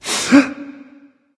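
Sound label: nonverbal breath; smite; voicepack; ullr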